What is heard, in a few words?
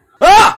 scream short version